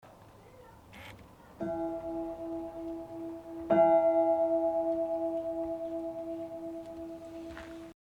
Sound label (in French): cloche; Nesles